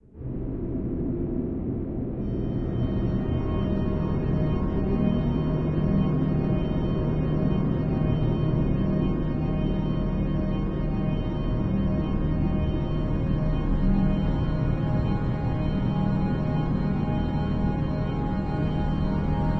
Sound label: Title Hum; Mr. Future Ninja